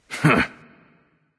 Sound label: lift; smite; voicepack